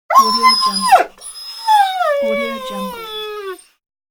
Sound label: puppy crying; wistansound